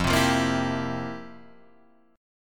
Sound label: F Major 7th Suspended 2nd Suspended 4th, F, down, Guitar, Drop D